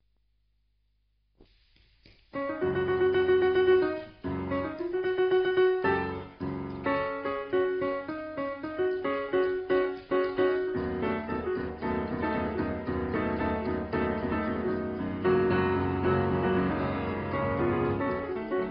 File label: piano boogie